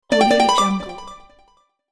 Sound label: medieval fantasy game bonus